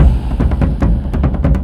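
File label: Music Shield; From Dust; ps3; xbox 360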